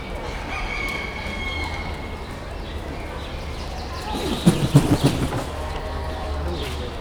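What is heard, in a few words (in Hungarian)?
földről a gályára felszáll, kondorkeselyű; nyiregyhazi zoo 2019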